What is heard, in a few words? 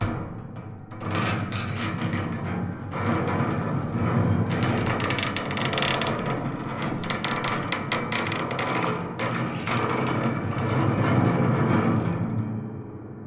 prepared piano string